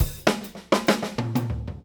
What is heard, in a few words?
Fills, Monster Beats; Zero-G